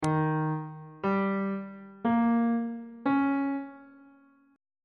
d sharp mixolydian 7th chord 1 is d sharp dominant 7th on bass clef